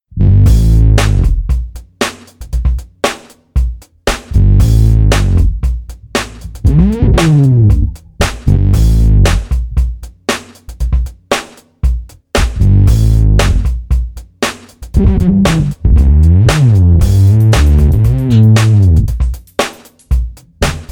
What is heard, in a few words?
me-20b patch; ME-20B Bank 3, No. 3; Fat synth bass sound.